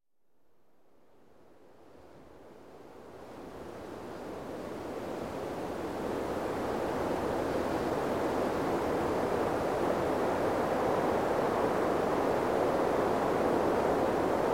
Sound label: Medium Wind Trees